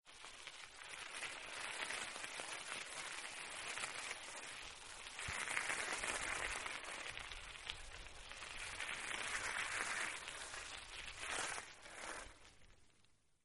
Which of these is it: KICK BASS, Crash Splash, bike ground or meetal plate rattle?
bike ground